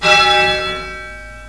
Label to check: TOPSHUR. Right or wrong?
Wrong